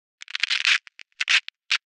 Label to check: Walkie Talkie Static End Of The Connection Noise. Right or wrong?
Right